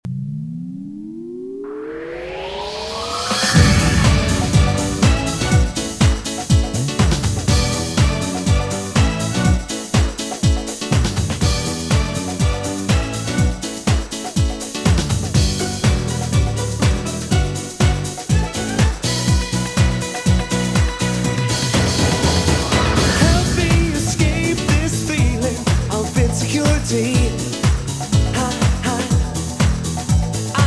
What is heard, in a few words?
Disco